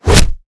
claws, weapons

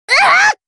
Hurt, voice commands Anime Loli; svencoop

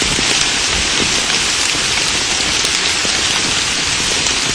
rainfall; svencoop

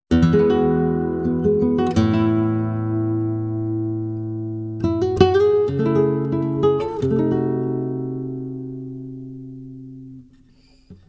flamenco; cstrike

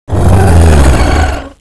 down; renesaur

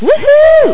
whahoo

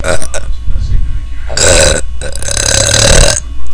burp; cstrike

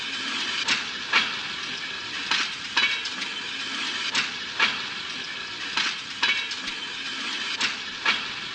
Train; cstrike; Stalker

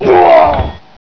die backwards; gearbox; demoman

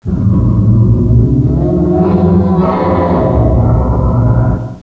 roar; renesaur

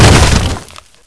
bust crate, debris

